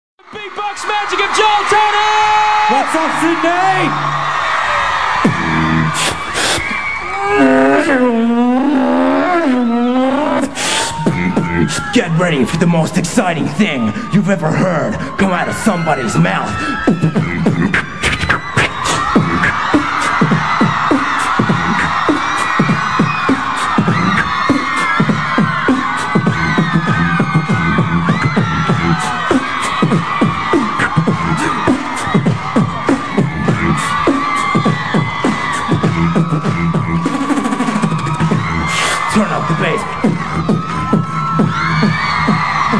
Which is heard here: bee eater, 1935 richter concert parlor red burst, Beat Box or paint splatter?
Beat Box